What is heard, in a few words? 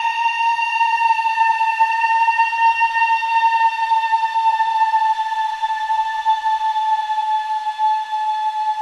jumpscare sound; fnati remastered 1 0